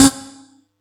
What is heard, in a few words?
Yamaha EX-5